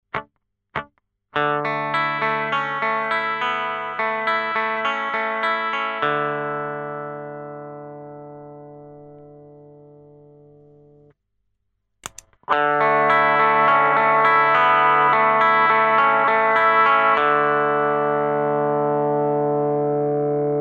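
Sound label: Arpege Without / With Sardine Can (Compressor + Rectifier + Color), guitar; Celmo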